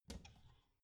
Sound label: bathroom cabinet door creak open heavy